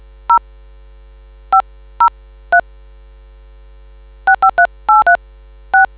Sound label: dtmf